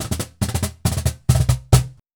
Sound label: Cajon Fill; musicradar